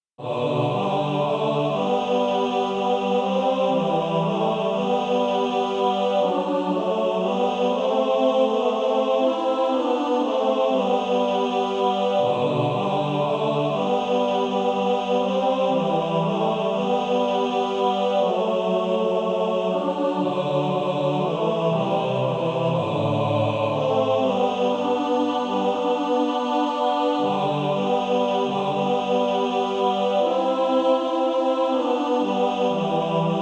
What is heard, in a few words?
Male Choir TB